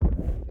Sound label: reel bounce quick; serengeti kings